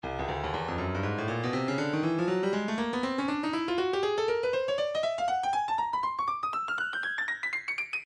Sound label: breakbeat 120 bpm; Ghetto Buster A; Stylus Breakbeat Midi Files